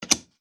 Tile Deal, Domino; Casualino

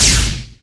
wall hit flash; inlogic-castledefense

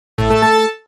win; pharaohslotscasino

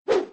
tap; inlogic-basketballsmash

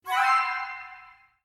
match; jewelpetsmatch; jewels

